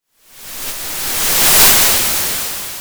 Sound of waves crashing on a shore

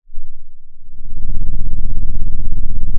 pop, Sound of an engine revving

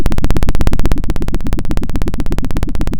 pop, Sound of a helicopter

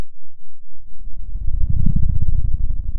pop, Sound of a dinosaur

